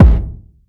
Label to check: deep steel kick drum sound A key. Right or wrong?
Right